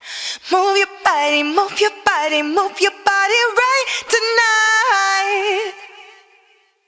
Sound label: vocal chain Delay